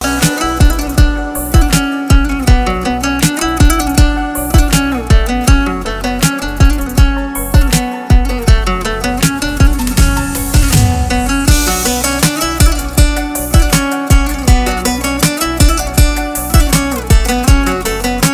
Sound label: Ringtone